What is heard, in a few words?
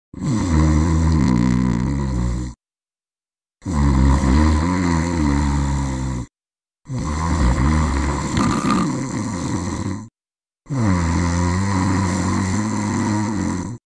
zzz